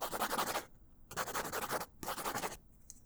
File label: Mech Pencil Scribbles; bb - Books, Paper, Writing